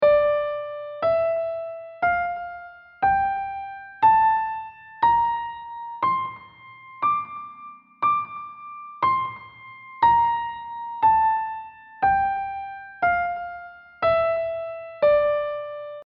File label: Aeolian; ear-training